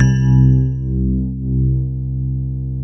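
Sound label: grand piano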